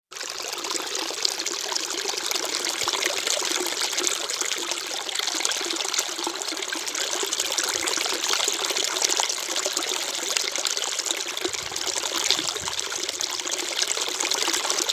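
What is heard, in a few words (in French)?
Cascade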